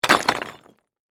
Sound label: Impact Rock Pile; Rocks; Materials; BlastWave